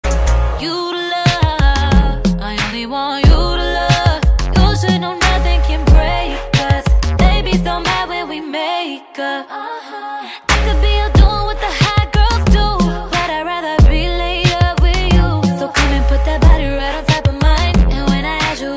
female part

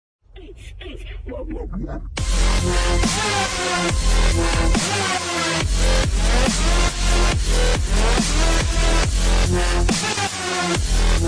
untz untz wub wub wub

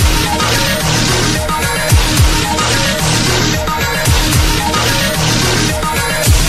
drop loop

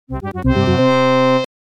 Coin; Tiger

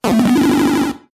Raccoon Leaf; SMB3

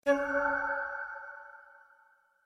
chirp

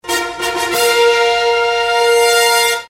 trumpet

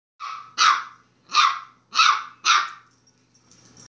Dachshund Puppy Bark

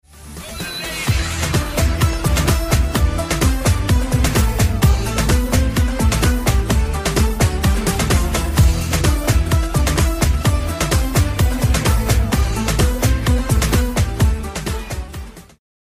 greek style, dance

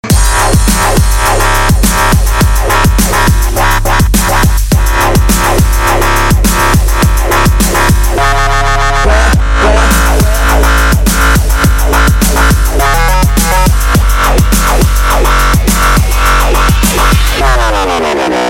dubstep

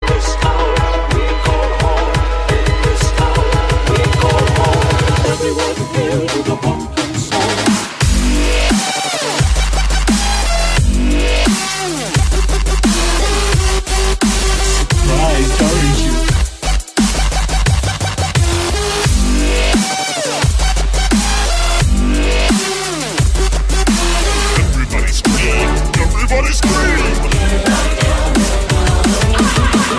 Halloween Town Dubstep

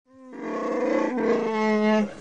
growl

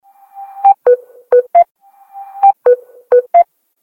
Double Connect SMS

